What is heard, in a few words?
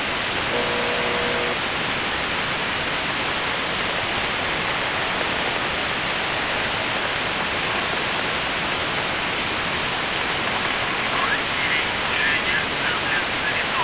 buzzer usb msg